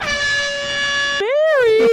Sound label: airhorn